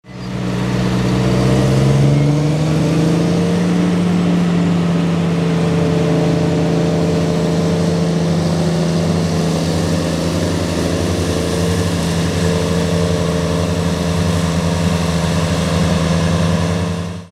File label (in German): Claas Xerion 3800 mit Disco 9300, Motor: Caterpillar; Leistung: 379 PS; Hubraum: 8,8 Liter